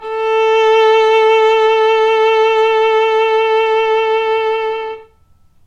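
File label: violin arco vibrato single A 3 lowered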